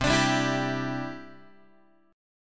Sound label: C-Major Add 9th, Guitar; strummed; JGuitar